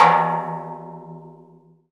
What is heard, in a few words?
PER Percussion